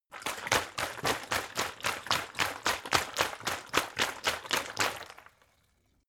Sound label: bottle water big shake slow; gastronomie; berufe